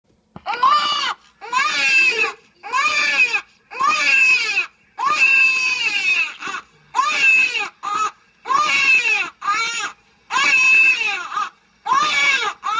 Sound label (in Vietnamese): Tieng em be khoc chao doi